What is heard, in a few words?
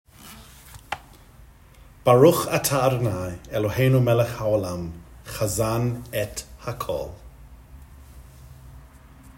Birkat hamazon; shabbat babayit